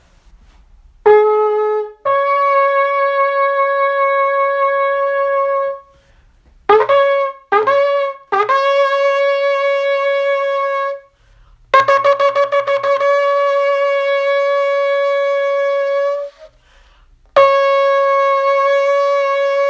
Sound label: Shofar